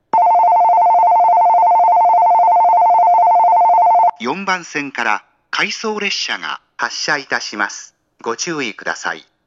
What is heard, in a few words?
Odawara Bell